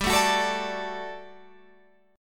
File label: G Major 7th Suspended 2nd G down Guitar Open F; GM7sus2; strummed